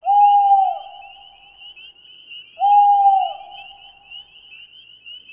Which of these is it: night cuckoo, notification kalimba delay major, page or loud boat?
night cuckoo